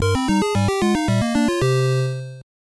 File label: Round Lost; Gotcha The Sport; NES